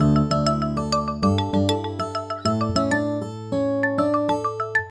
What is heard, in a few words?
String Beats